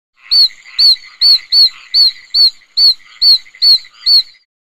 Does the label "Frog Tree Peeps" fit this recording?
Yes